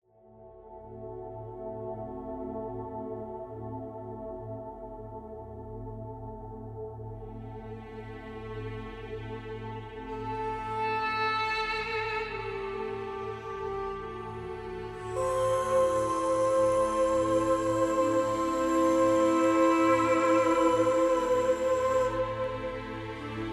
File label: crying for son